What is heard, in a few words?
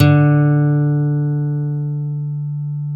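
D2 acoustic guitar, 6-STRING; InVision Interactive; Lightware VOL-1; Instruments & Percussions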